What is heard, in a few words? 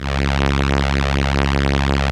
STR ELKA, Elka Strings, Elka Violins